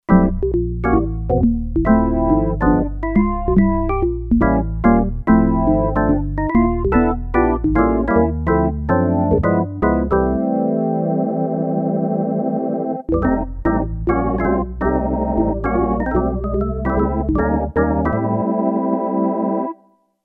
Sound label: Rotating B's & M's; Factory Programs